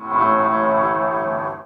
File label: Short Sound